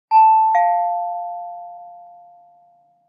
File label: Door bell chimes, ding dong